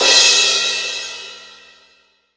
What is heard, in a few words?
crash cymbal single shot f sharp key